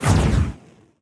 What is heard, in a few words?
shooter; Furcherro